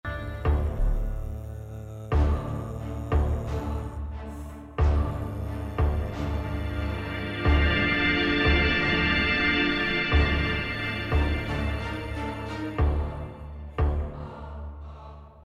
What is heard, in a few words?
entry music